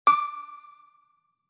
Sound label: Ping